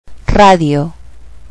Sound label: radio